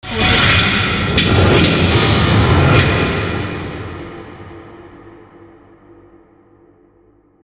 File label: hard metallic incoming effect